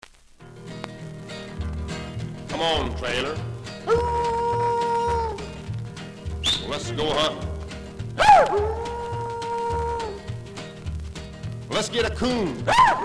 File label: Yodel